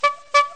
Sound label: Bike Horn; muppets